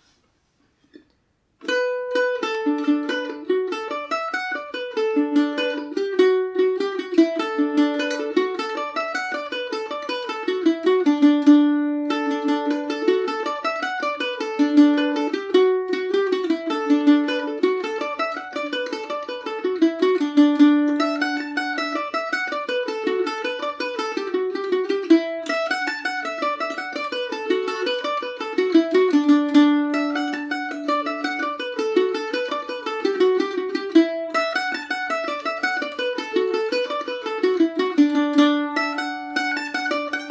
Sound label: wandering minstrel dmaj, mandolin; The Wandering Minstrel; Paris Swing “Macaferri” mandolin